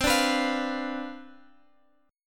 C Major 7th Suspended 2nd Suspended 4th C down Guitar Open G, chord